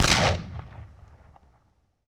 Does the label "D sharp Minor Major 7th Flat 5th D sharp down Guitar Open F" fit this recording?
No